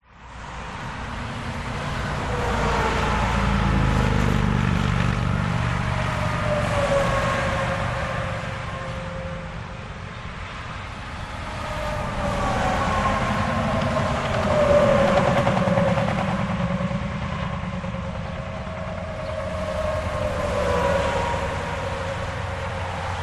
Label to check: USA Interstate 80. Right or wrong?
Right